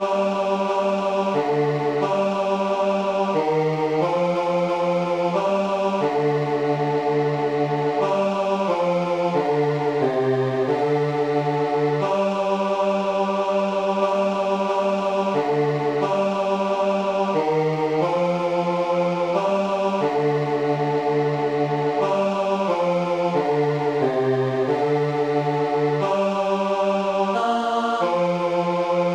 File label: coventry carol Bas; bedfordmodernsingers